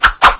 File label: jump, headcrab poison; Garry's Mod; hl2